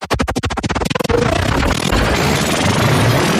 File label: Glitch Transform